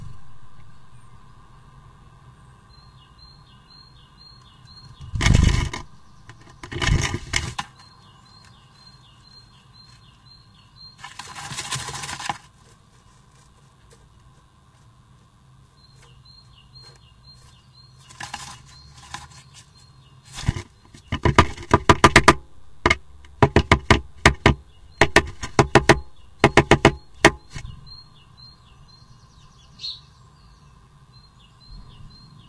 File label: nest building